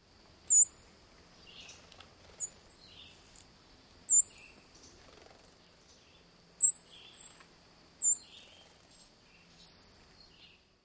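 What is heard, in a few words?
Song Sparrow contact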